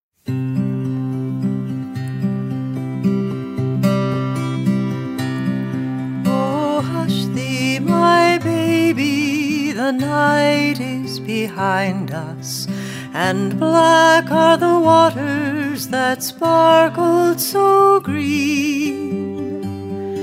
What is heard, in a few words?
Seal Lullaby; song of the month